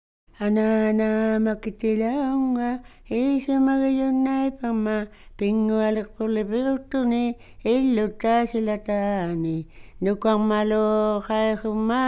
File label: Song For Two Children, INUIT